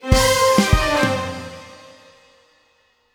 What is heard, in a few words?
Disco Stinger Stab; Plants vs. Zombies Battle for Neighborville